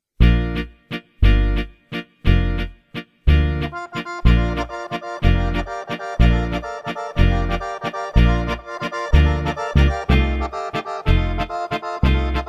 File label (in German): Kontrabass 2 und Git1 Mix